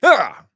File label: Grunt Attack; paladins; Astro